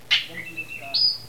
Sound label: clap whistle lofi